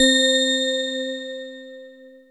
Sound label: BELL C4, SYNTH, Trance; AMG; Global Trance Mission VOL-2